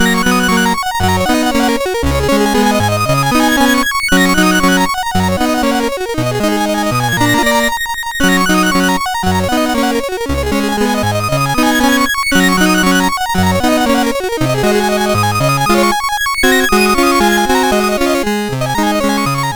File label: Etude on the Black Keys, MOS6581R4